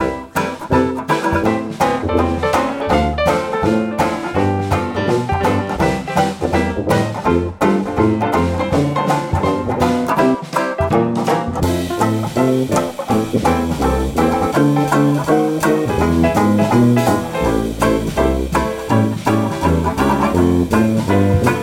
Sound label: Jazz Dixieland 138